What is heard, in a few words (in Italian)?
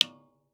ir magnetostrittivo, dml vetro; magnetostrittivi